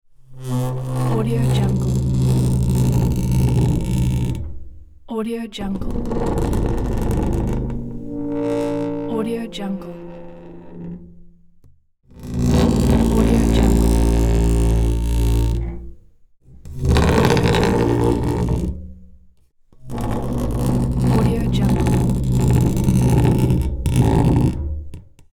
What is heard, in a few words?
heavy metal container slide or move